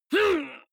male fight pain groan short; vocals; comedy-cartoon; soundpool

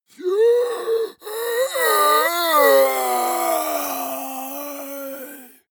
monster die; kreaturen

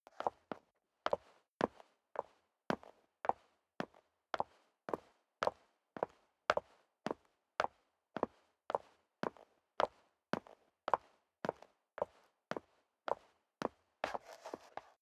step leather shoes concrete upstairs sequence

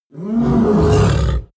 coloss groan deep, Creatures; kreaturen

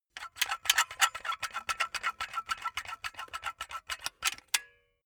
silicone gun empty pump fast; berufe; handwerker; soundpool

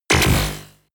sci-fi buzzing shot; schusswaffen

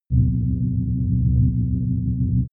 digital idle smooth low; atmosphaeren

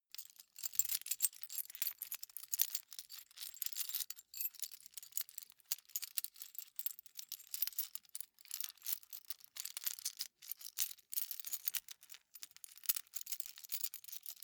key ring large rattle sequence soft; objekte; schluessel

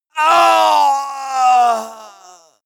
male death cry; schreie; menschen; soundpool

